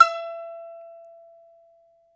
BAGLAMA, Plucked Strings; E-MU Producer Series Vol. 7 – Old World Instruments

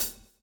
SWING

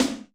Tech Gate; Alesis SR16

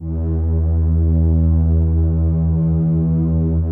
PAD E3

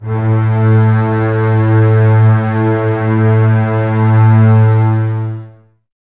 Basses A 2, String Ensembles; Miroslav Vitous; 90 s Sample CDs

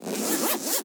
Zip Stop; Kagi; SFX; M8; percussion and foley

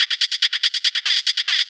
Hat 142 BPM, Club Techno, Drum Loops, Hat Loops; Zero-G

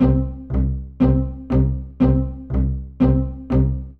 120 C string, String Loops, Trance; Trance Explosion Vol1

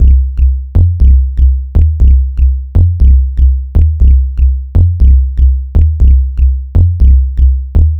Bassline; Drums & Drones

